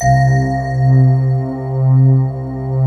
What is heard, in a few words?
LINEAR SYNTH